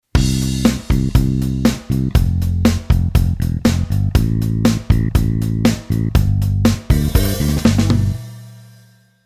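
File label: MIDI Track Delay Normal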